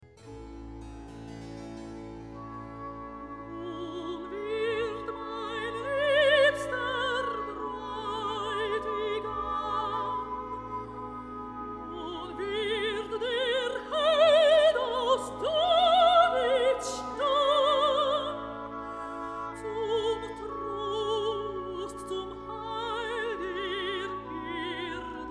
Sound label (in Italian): Recitativo; Bach Johann Sebastian; BWV0248; Weihnachtsoratorium; Nun wird mein liebster Bräutigam